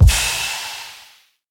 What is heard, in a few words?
necromancer pestilence